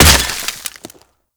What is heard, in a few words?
Ice Hit